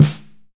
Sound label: small reverb steel snare drum sample D key, snare tuned to the D note